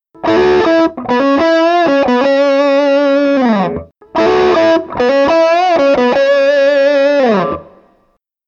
Hall

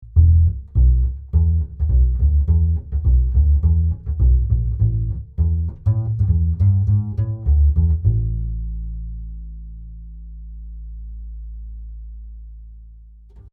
DPA 4060 Bass Kugel F-Loch

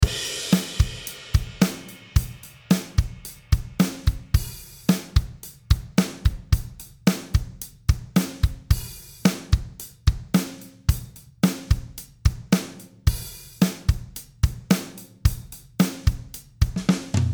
CLA-2a Drum Kompressor; Waves

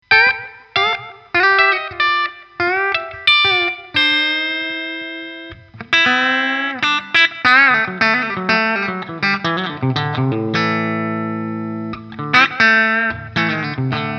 Stegpickup clean

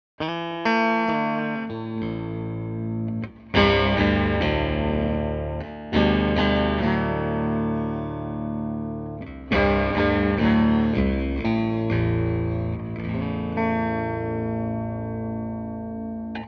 steg

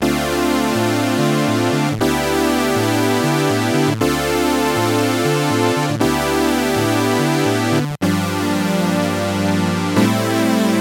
Sawtooth Synth ohne Effekt

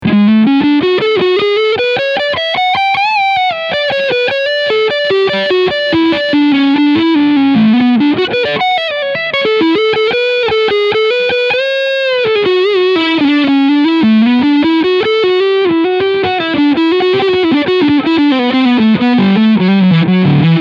Skyhawk E-Gitarre Overdrive Neck Pickup

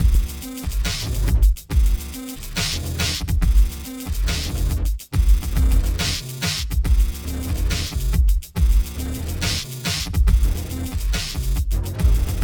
Elastik dupstep

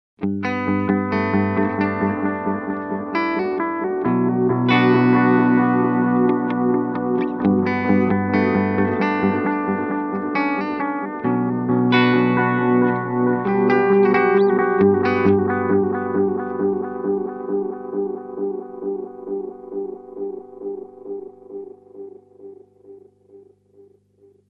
Seymour Duncan Vapor Trail mit Chorus

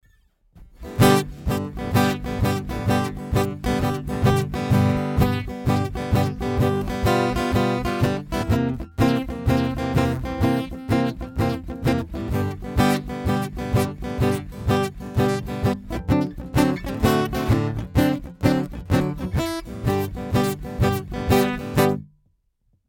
Cranborne EC-1 Gitarre Thump

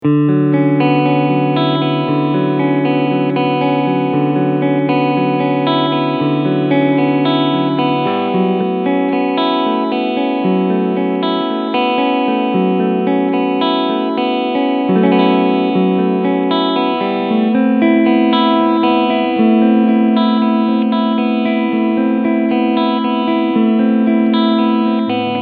Clean beide PUs

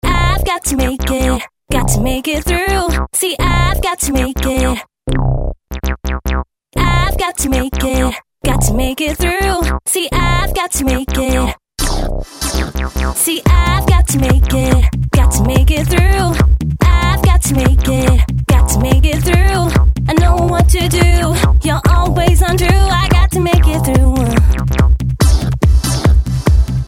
beispiel 143bpm

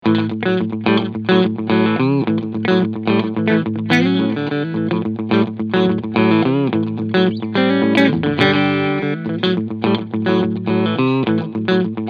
TE 52 Cleansound beide PUs; Harley Benton

